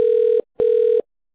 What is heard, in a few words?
ringback tone; Samsung Galaxy S8